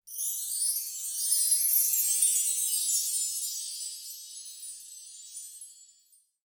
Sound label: Kirakira; Yu-Gi-Oh! Master Duel